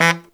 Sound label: baritone fx; Zero-G; Phantom Horns